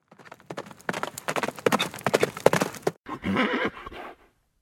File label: Horse; cinematics; Age of Empires III - Complete Collection; Microsoft Studios